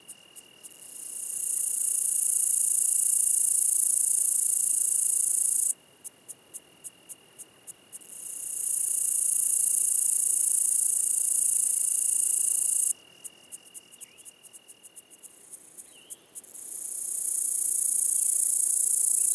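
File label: Ambience Night Summer; Griftlands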